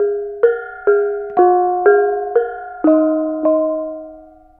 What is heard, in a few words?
BONANG; Spectrasonics; Heart of Asia; BONANG PH